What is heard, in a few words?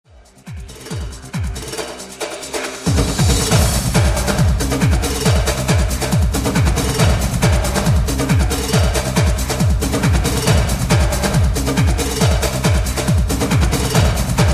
Samba Dance